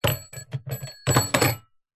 household alarm clock with bell set down then knock over table